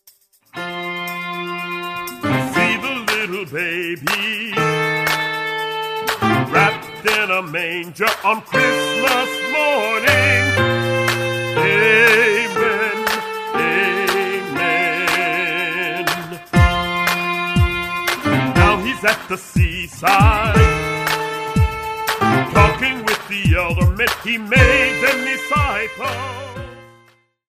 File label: amen